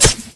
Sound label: hopper attach; svencoop; hl2